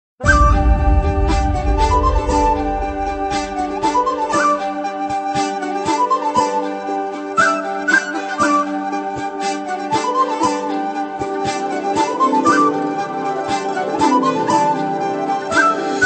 5 tones

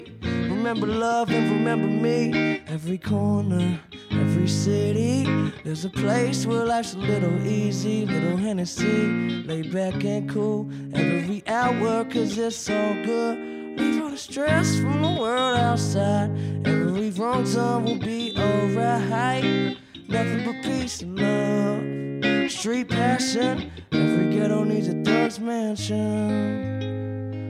Chorus

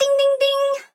ding ding ding

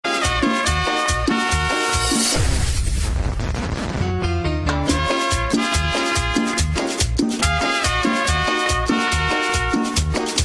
Cumbia Mix

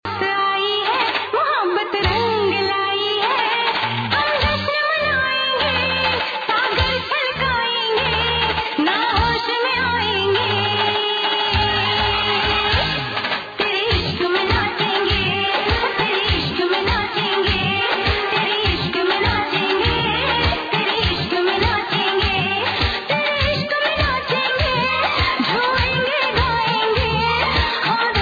Bollywood Song

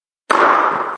Tennis Msg

